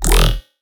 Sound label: Bass E Snail, Dubstep; Bass One Shots; Ghosthack; Dubstep Sound Arsenal; 50 Bass One Shots